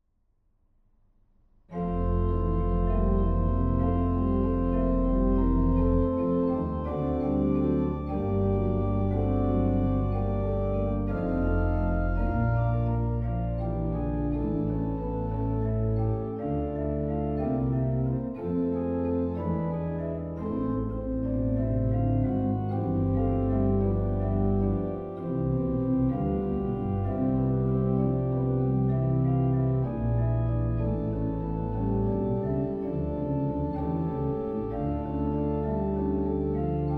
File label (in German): Praeludium F; organ; Präludium in F; goenn; 1844 Franz Xaver Engelfried Organ; Moritz Brosig (1815-1887)